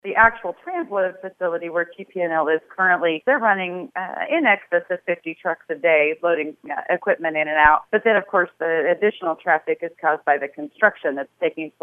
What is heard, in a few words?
truck traffic